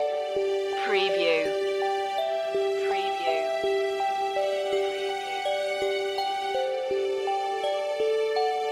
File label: christmas morning loop